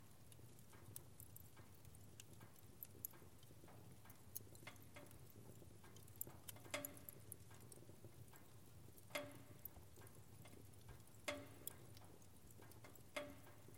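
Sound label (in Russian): лампа с огнем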